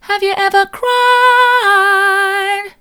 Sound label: have you ever cried mid, vocal